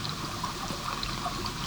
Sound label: Waterway A Dark